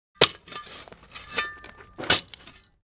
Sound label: Metal shovel dirt impact